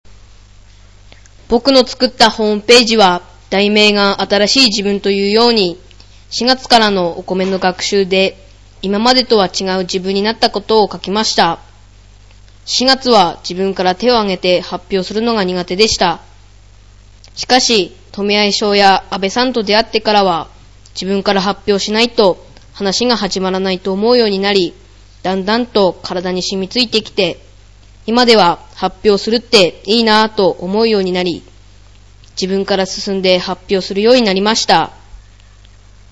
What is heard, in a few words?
tuba; 声のメッセージ